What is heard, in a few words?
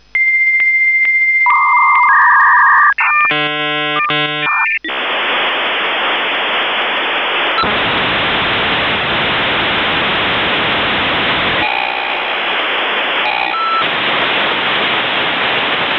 v90, modem